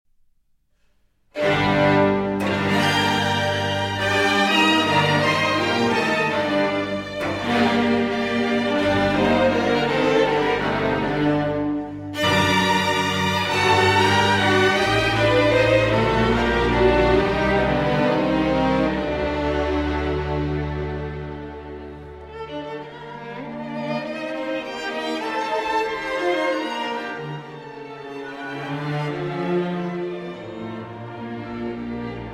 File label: Introduction and Allegro for String Quartet and Orchestra